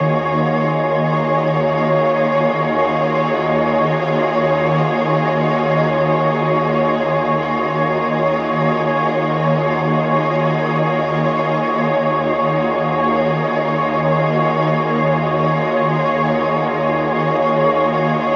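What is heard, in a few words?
pad loop helpless 150 D# minor; Virtual Riot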